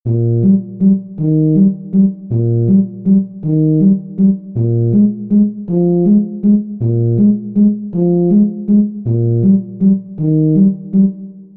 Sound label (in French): Poum Tchichi; Basses et Barytons; Avec trois temps maintenant